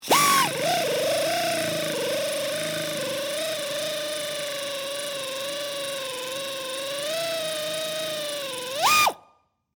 Air Ratchet Screw, Industry, Air, Ratchet, Screw, Bolt, Into, 4X4, Long; SoundEffects-BlastWave